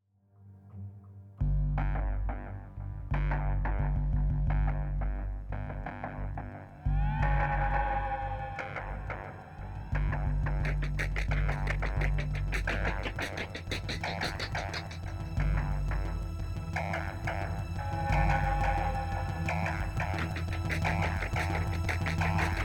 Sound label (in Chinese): 广告片音乐